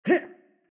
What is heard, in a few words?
Jump